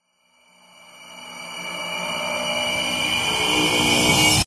horror riser fast shrill strings drama evil; zapsplat

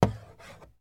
office chalk stick single stroke on chalk board; sound effects one

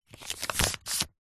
money 20 dollar polymer note run through hands

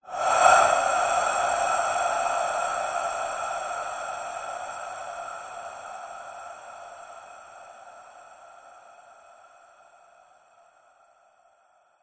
horror breath sinister evil large reverb; zapsplat

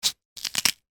horror gore knife stab quick twist body crunch; zapsplat

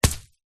foley heavy rock drop on ground

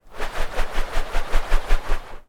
foley usb cable spin swish

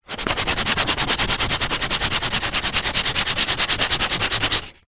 industrial sandpaper rub smooth wood surface

